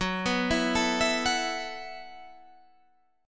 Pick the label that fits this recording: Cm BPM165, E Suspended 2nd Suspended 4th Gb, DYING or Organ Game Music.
E Suspended 2nd Suspended 4th Gb